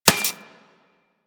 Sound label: Laser Impact Metal; Surface